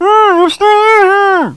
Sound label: COFFIN; mtm2demo; PC Gamer 4.2